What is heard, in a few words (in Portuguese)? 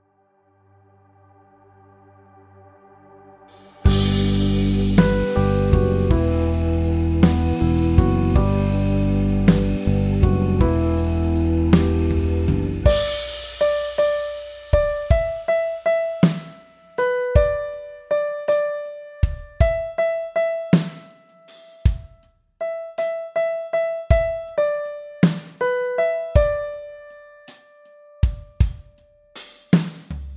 Fem Melodia com Bateria